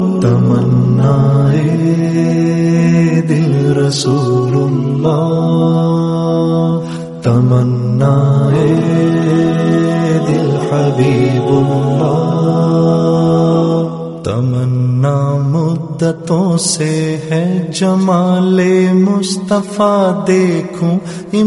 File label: naat